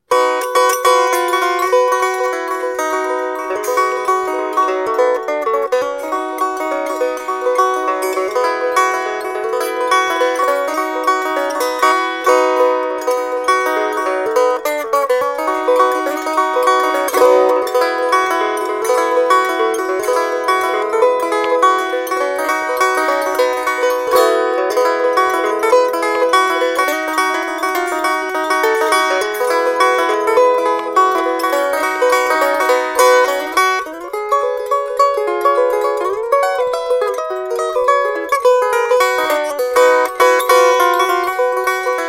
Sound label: banjo